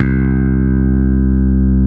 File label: bass